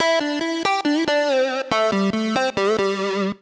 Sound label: Wah Lead (Am) 140bpm; Music Loops; Ramsel Wah Lead; Ableton; Factory Packs; Singularities